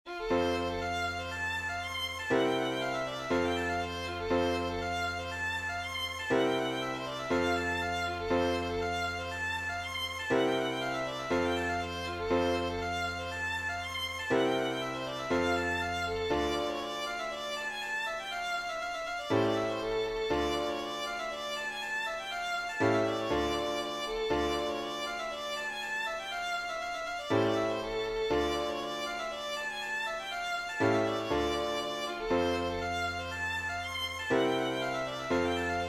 ruotsin katrilli

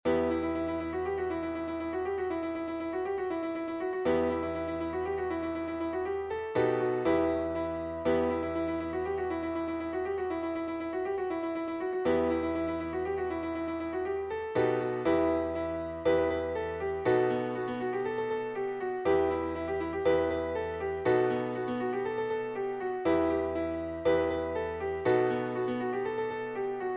polkka

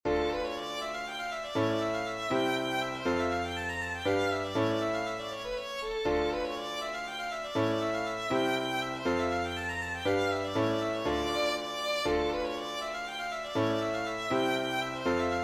polska

